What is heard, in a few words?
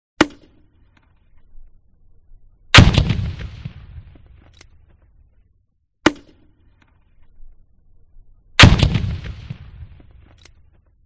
Grenade Launcher (Twice); SOUND EFFECTS1